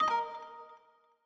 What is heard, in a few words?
Error, sound scheme; Longhorn 7ista Sound Scheme by David Mounder